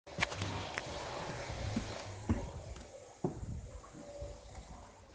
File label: whatsapp